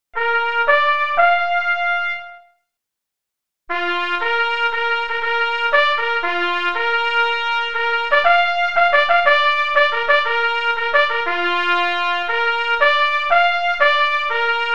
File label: Bugle Call Call To Quarters